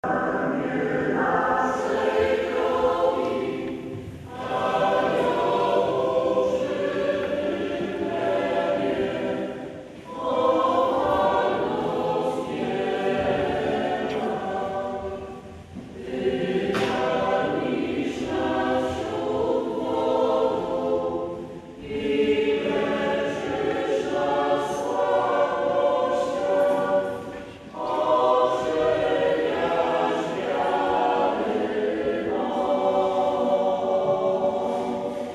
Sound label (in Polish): Chor Parafii; Tymbark